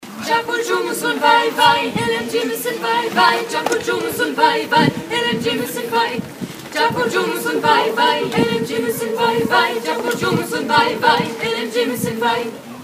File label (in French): Capulcu aigu refrain; voix haute 2ème refrain